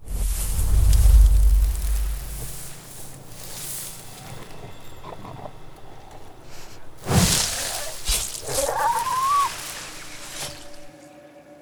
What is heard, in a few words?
flashback; FYC